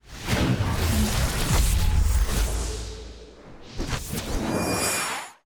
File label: lobby transition; clash